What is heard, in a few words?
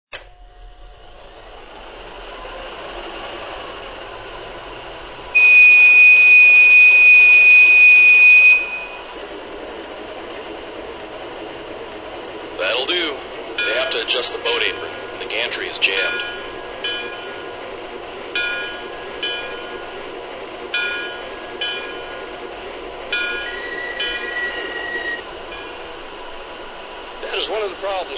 BB1, trains